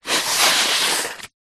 human blow nose into tissue paper

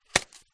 foley polystyrene piece snap; zapsplat

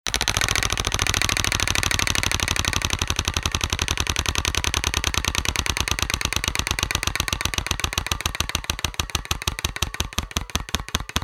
wheel of fortune style wheel spin; zapsplat

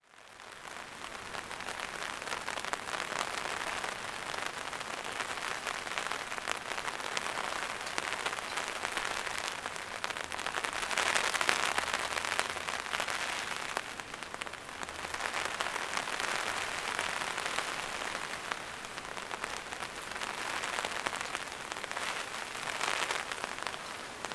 rain on umbrella windy; zapsplat

